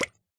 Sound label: bubble, bubble column; Minecraft; block